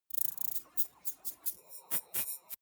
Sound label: glitch transmission